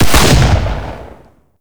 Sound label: Shotgun Shot; Warframe; Tenno; Alchemist